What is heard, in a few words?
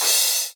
crash